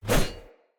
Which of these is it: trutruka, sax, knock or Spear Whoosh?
Spear Whoosh